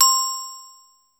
Bell, Yamaha Motif ES drum machine; 300 Drum Machines; Yamaha Motif ES-Series

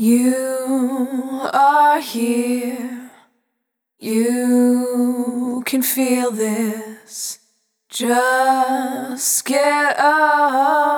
Multi Voice Loops, Vocal Samples; Sonic Foundry (Sony Creative Software) - Pocket Diva World Class Vocal Samples

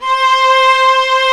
STR, Vlas Sect, Agitato

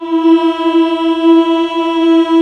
MALE HUM E3, ENSMBL; AKAI S-Series CD-ROM Sound Library VOL-8

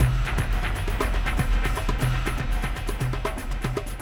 Choo Choo Crash, Drums; Sonic Foundry (Sony Creative Software); Friendly Fire; Marc Steve

